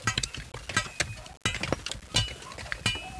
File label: MINE; Caesar III